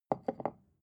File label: glass put down wobble short